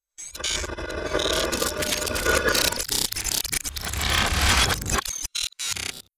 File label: technosphere closing; Stellaris; guardians